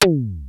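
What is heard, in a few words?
electronic tom, electronic drums; Crypton - DrumBase5000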